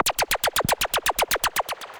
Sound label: Stutter Synth; Effects; Ableton; Glitch and Wash